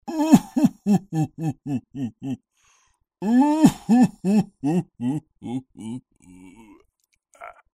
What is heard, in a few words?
risa llorona weeping laugh